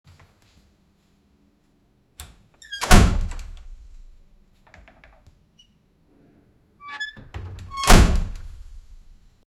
a creaky wooden framed sc, doors

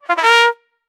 medieval battle trumpet; instruments

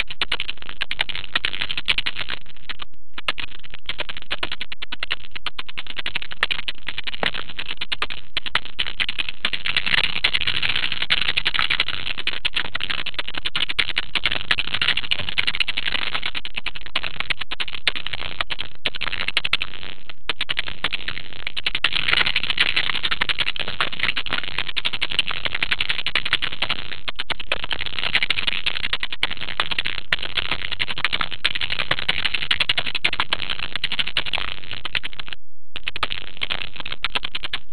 a heavy greasy mechanical

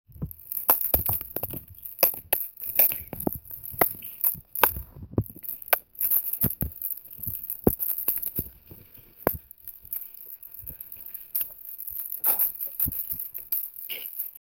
industrial

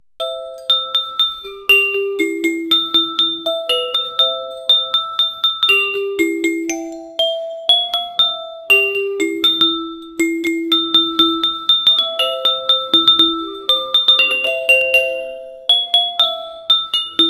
an elephant playing the m, holiday

